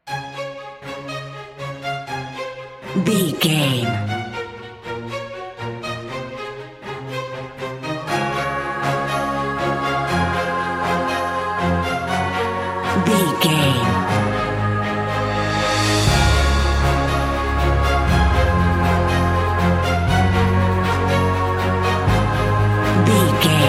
cinematic corporate no drums

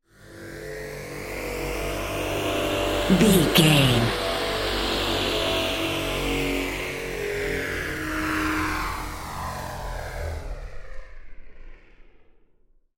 ominous war plane heard courtyard

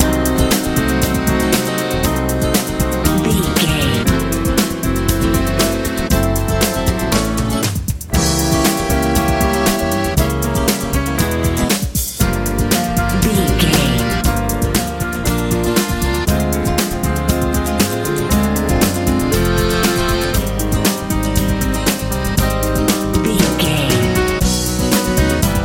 funky pop

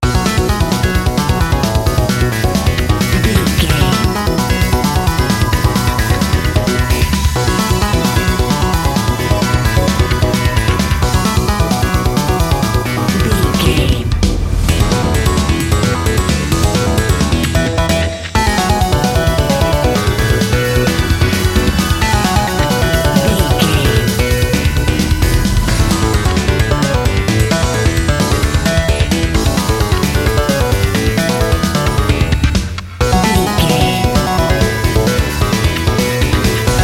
extreme racing video game level

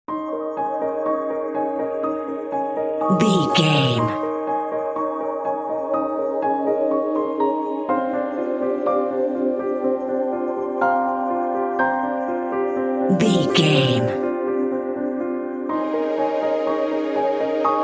enchanted magical forest no lead

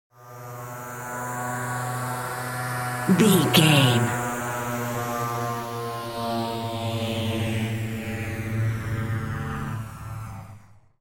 ominous war plane

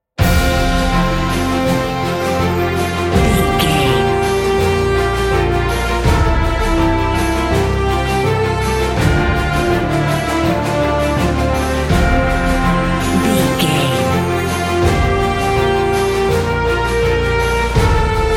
cinematic corporate

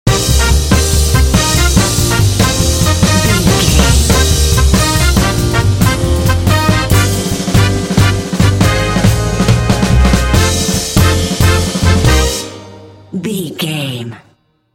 uptown swinger sting

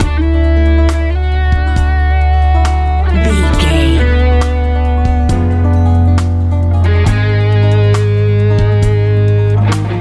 happy big warm airplane life easy pop

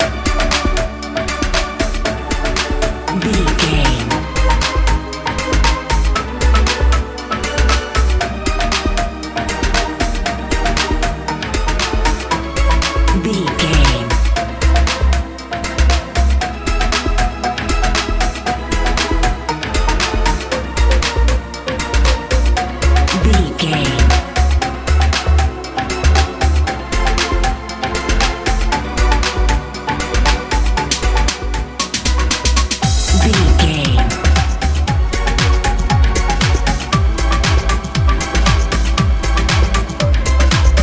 happy good house

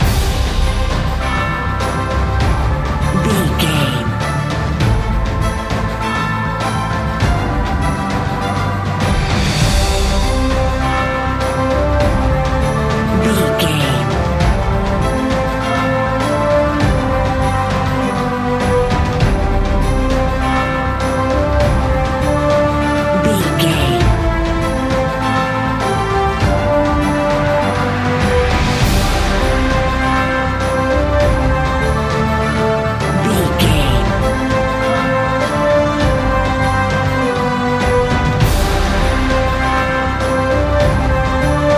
epic adventure loop

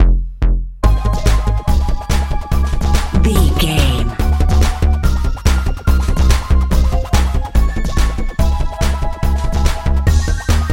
good bright dance

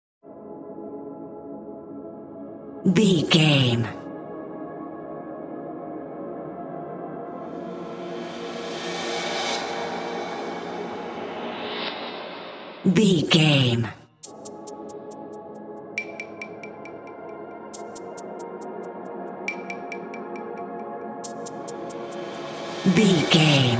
sinister foreshadowing bed

